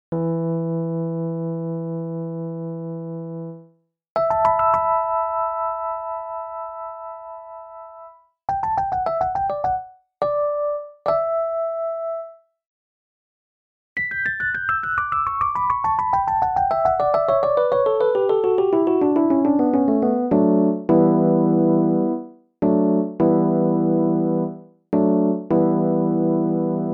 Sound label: epiano; mda epiano